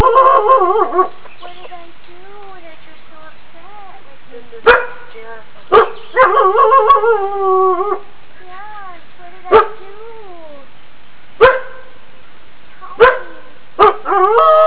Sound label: wolf howl